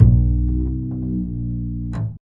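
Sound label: glissando, double bass; Univers Sons; Jazzistic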